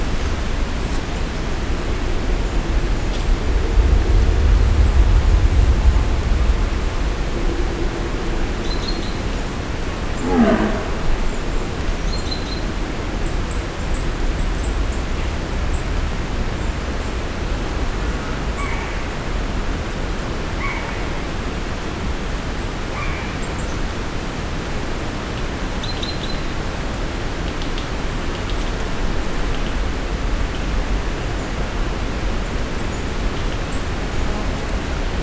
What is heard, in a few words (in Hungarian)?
pillanatkép megszólaló bika, gímszarvas